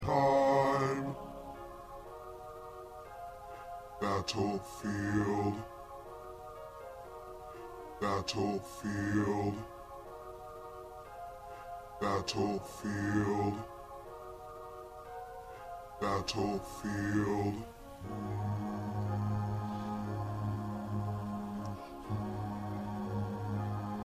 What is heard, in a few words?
2nd Bass, choir